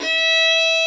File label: fiddle E4; InVision Interactive; Lightware VOL-1; Instruments & Percussions